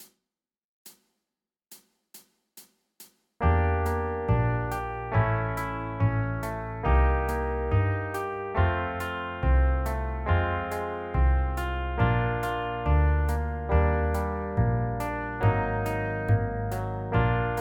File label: triads arp asc desc